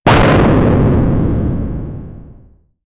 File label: boom kaboom